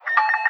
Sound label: alert, sentry